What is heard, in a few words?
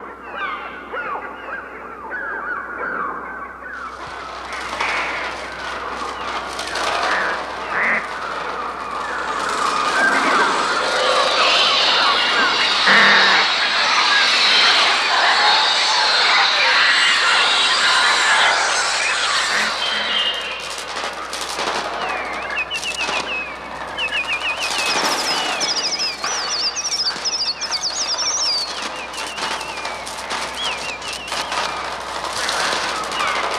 Sound Effects from The Birds; OSKAR SALA